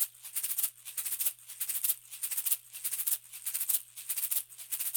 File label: 96, Percussionworks loops; Sampleheads; GJ LOOPS 96